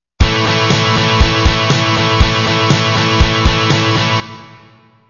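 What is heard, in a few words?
distortion, guitar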